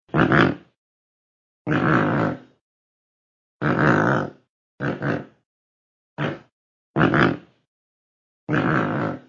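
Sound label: American white pelican pelecanus erythrorhynchos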